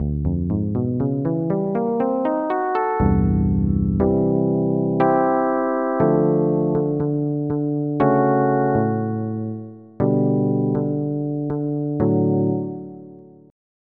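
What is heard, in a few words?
Fretless Bass; FF7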